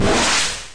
old extend; RyannSFX

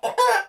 loud cluck; TouhouLS; Chicken

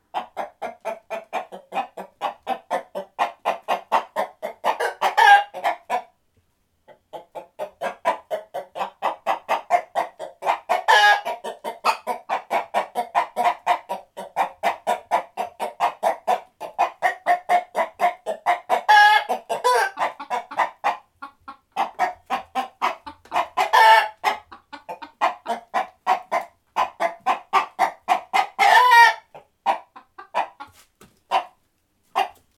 Chicken noises; TouhouLS

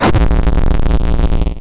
gameover; cenotaph

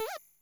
enemy Shot; ReisenYoumuOuting

